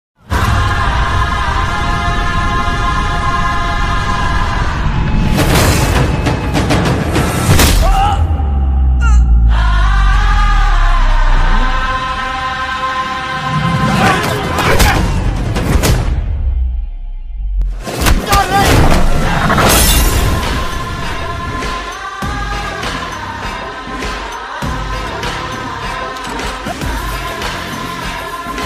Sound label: orchestral music bgm; falaknuma das; tharun bhascker